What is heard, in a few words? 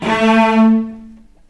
A2, VCS ARCO, String; East West; The Ultimate String Collection